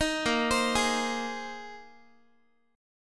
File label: Bb 7th Suspended 2nd Suspended 4th Bb, Guitar 4A 4E 5A 5D 5G sharp 6C sharp; Bb7sus2sus4; arpeggiated; 18,18,15,18,x,x; JGuitar